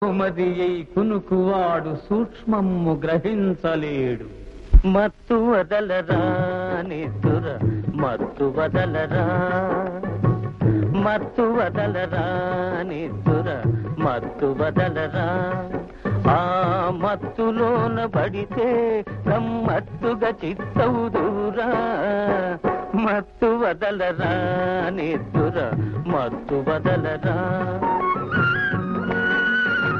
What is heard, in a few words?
telugu song